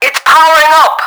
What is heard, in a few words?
power up; english; Battle Engine Aquila; XBOX